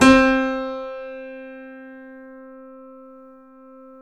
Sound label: C7; East West; The Ultimate Piano Collection